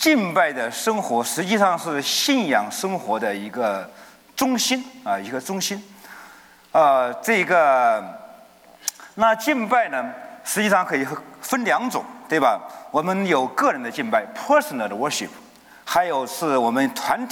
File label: Chinese worship Training